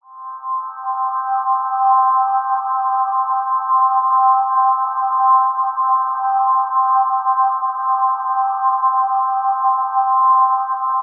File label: Atmosphere Sound C Pad; Interstellar